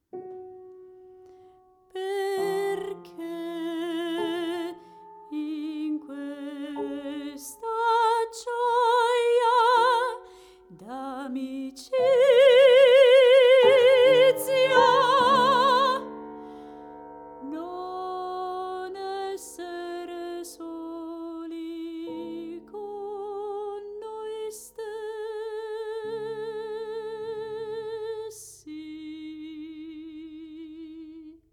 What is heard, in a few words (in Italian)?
Voce Pianoforte